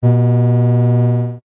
science fiction conveyor belt short movement whirr